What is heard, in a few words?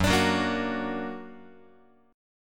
Gb Augmented Guitar; x,2,5,4,2,5; Gb+ strummed